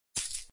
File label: coin